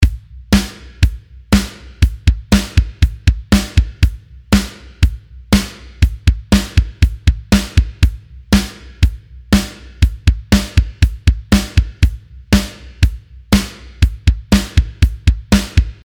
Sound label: Double Bass Beat, drum